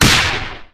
jet lunge; galebreathe

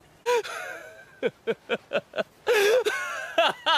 laughing; sabito

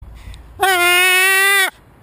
seagull; stole gopro

